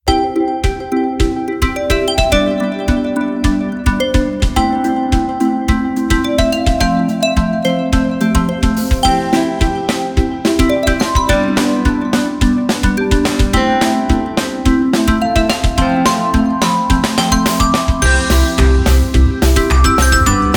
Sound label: one plus stock tone